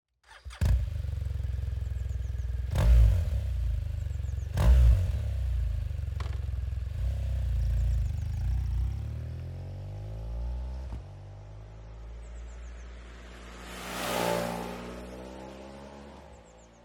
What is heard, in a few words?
dynamic sound